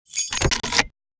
keys ignition

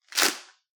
tape pull; soundscrate

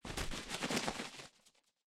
Bird Wings Fly Away; SoundsCrate

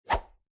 arrow swoosh; SoundsCrate

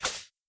snake attack; soundscrate

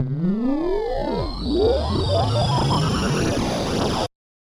Laser Charge Up; SoundsCrate

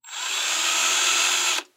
drill fast medium

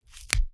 Bone Crack; SoundsCrate

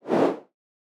air woosh; SoundsCrate

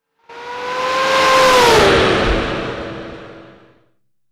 car drive by

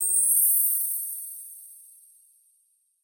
fairy dust

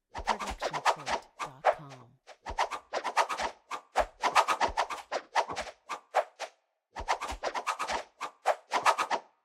arrow swooshes multiple

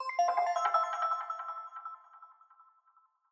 click fx tonal

